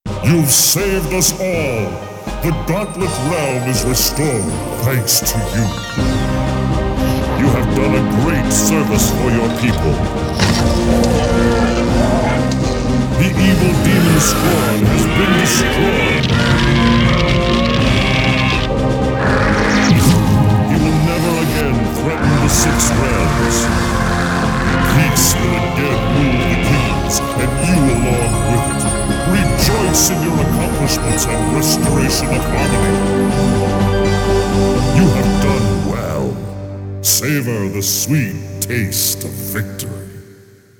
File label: Ending; Gauntlet Legends; PSX